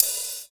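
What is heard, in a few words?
OPEN HAT; East West - Phat & Phunky CD2; 90's Sample CDs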